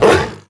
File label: Leopard; dmg; Seer; GamigoNA